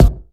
original kick drum one shot g sharp key

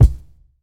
reverb kick drum sound d sharp key; kick drum samples

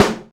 original snare sample g key

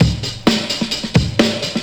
130 bpm 00s drum loop e key; Duration 0:02

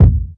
smooth kick drum sound a key; kick drum samples

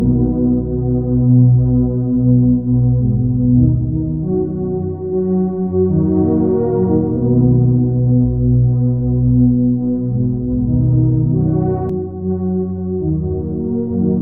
lofi pad melody smooth 135 bpm B maj; instruments; loops

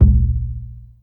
ambient kick sample a key; kick drum samples

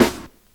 nineties hip hop steel snare drum f key

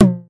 high floor tom drum one shot f key; tom drum samples

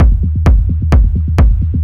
heavy clicky techno kick loop; drum loops

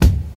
studio bass drum sample d key; kick

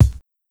kick drum sound b key; Duration 0:01

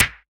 short snare single hit A key; snare-drum-samples

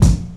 crisp kickdrum sound c sharp key; Mono; kick drum samples

